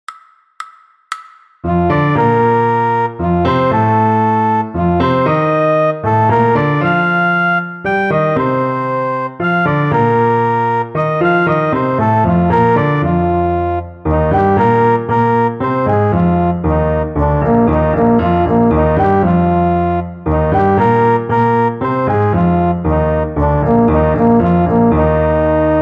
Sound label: fast, trombone